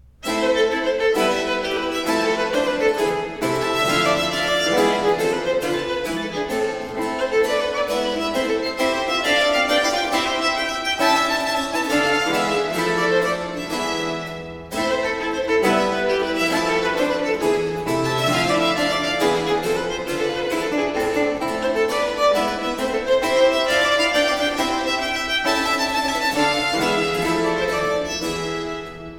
Sonate 12 in B minor 3. Giga, allegro; Arcangelo Corelli; Corelli - Complete Works; Klassika

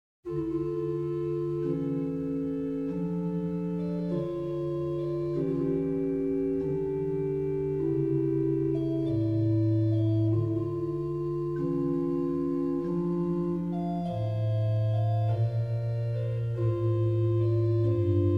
Organ Concerto in F major - Adagio; Op.4 No.4; Handel Masterworks; Klassika

